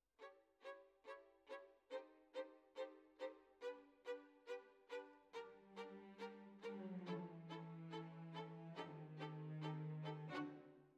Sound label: Violins, jazz for piano